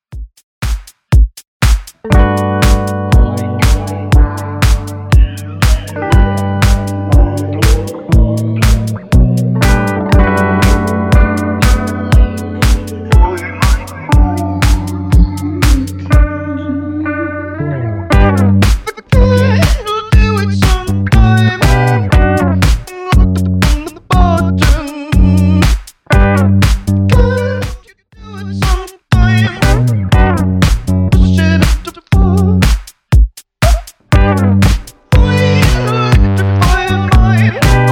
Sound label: Redrum 120bpm